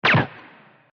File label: wall; alternativa demos; ancient